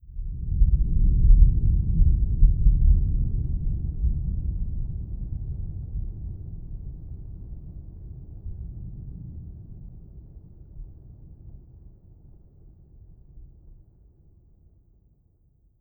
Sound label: thunder